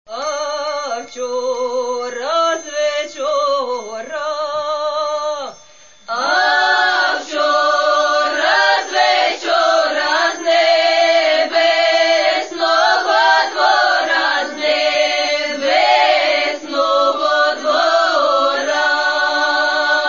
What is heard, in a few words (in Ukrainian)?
koljadky